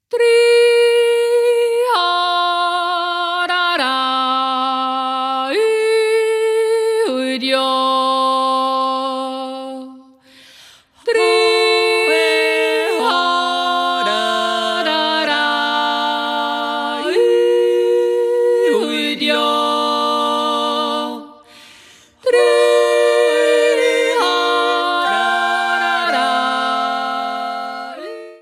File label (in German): Aberseer Jodler